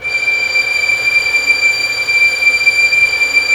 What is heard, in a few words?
STR VLS, STRINGS; Roland - LA Composer vol. 1